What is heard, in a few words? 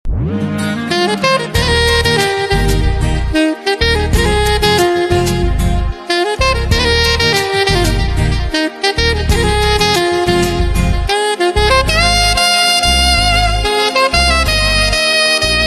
Saxophone